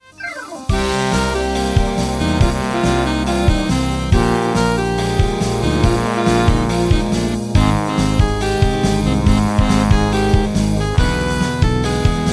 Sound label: Comical Strumming; RINGTONE; MIUI12